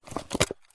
food drink plastic fast food drink lid take off cup; zapsplat